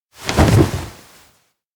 emotion burst; anemoi

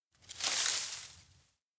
bamboo leaves; anemoi

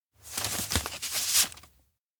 letter open takeout; anemoi

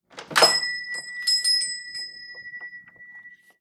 door open station cafe; anemoi

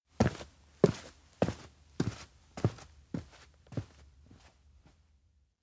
steps outdoor plaza walk; ken; anemoi

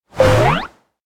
elec knead wind; anemoi

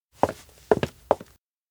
steps outdoor town approach; fumiya; anemoi

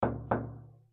door knock wooden; anemoi

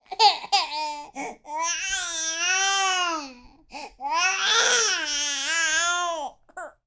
baby voice; anemoi

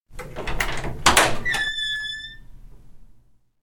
door trailerhouse open; anemoi

